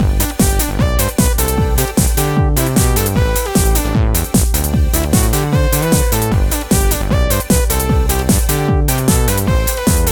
BGM Sheep Mode; crossfire online

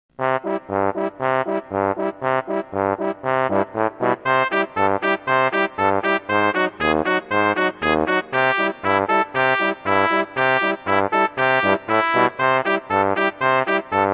Kickabout; Banjo-Tooie

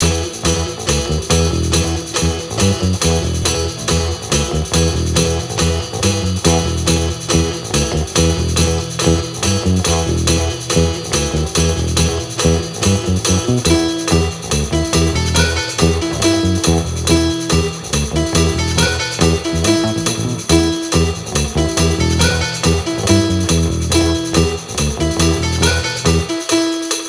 Bike Fast Mid; bully scholarship edition

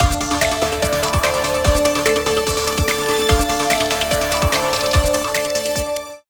Victory Stinger; Arilou

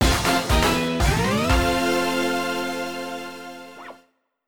Jingle Game Clear; WarioWare: Smooth Moves

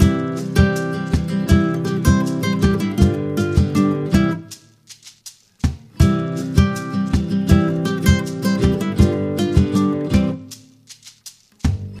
Calypso Dance

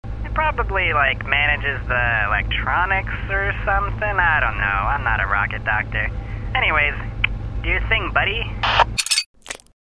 Engine Room

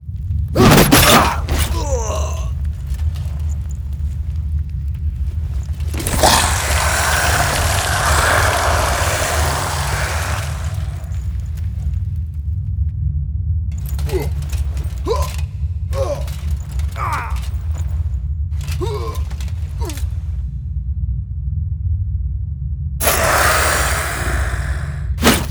Boss Fight End Sfx

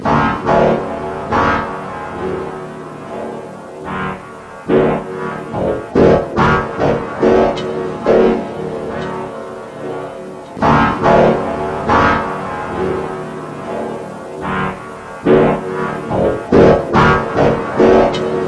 Opening Titles Melancholy; Jimimod

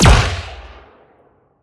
Sciweap Shot Small Gun Chaos Colt

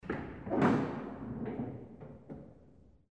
Hinged opening

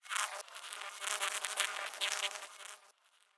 Insects; we are the dwarves gamerip 2016

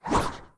Info Swoop; candy crush jelly saga gamerip

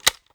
Button

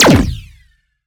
Shot Small Laser Recon Pistol; Robobeat

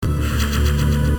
missile tank; Metroid Fusion game rip

